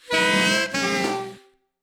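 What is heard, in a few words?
Pump breath; The Act; Arcade, iOS, MacOS, Mobile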